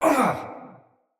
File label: Pain, Battle; Mendicant Wazard